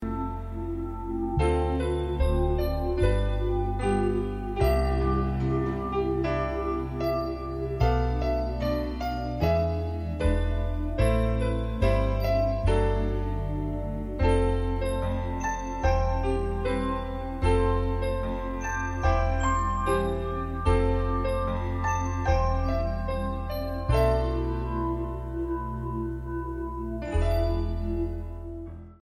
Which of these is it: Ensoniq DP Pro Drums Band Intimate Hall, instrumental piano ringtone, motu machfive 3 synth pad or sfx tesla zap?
instrumental piano ringtone